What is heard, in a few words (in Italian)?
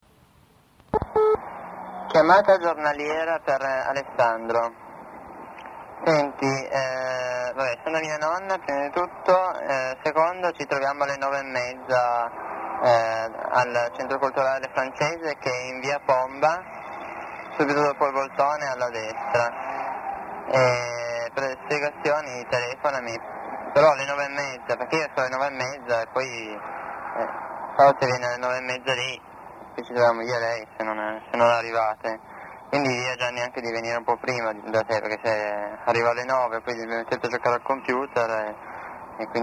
beat 9 e mezza